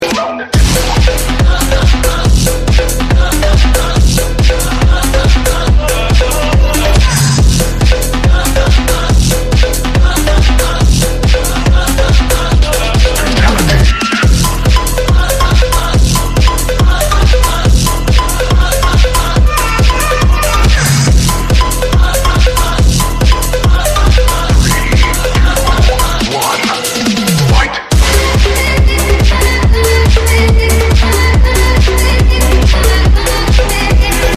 phonk loud; Voicemod

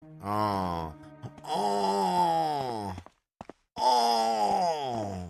aww; raven

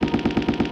gun shots; essential effects fx

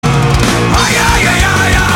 ayayayaya

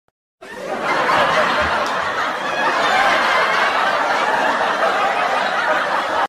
crowd laughing sound effect

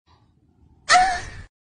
weird guy moaning

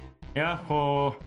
yoohoo

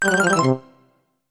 video game lose, funny game lose tone

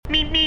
Road Runner Meep Meep

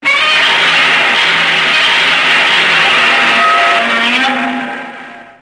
godzilla roar; Voicy; howard godzilla

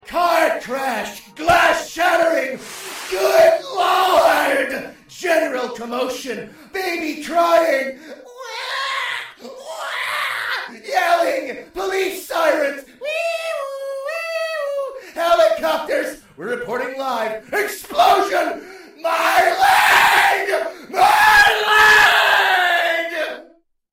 car crash; sebastian solace from pressure

